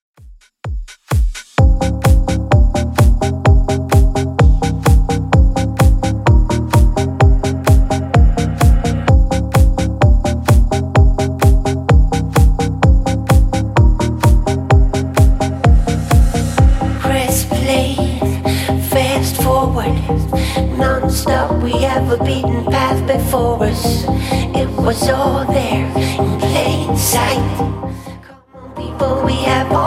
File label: 2ks Redrum 130bpm